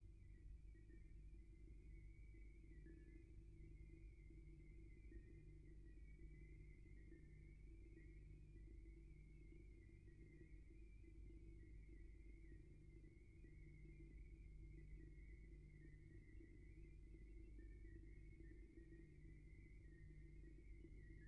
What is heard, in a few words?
Backing track